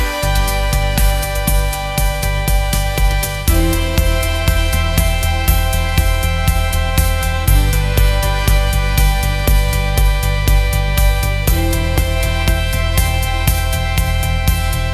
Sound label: upbeat pop melody, ringtones